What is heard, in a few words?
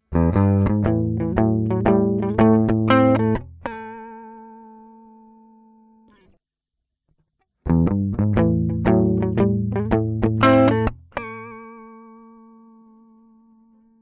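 gtr trans tube, guitar; Universal Audio 710 Testbericht; g11 transtube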